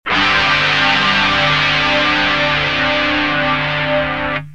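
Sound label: guitar clone theory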